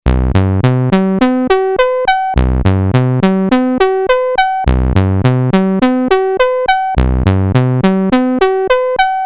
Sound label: doepfer fifth